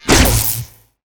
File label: Beamcell Advanced Cast